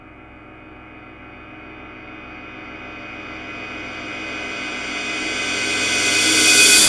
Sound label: Cymbal Reverse Hit; Sonic Foundry (Sony Creative Software); The Electronic Music Manuscript Collection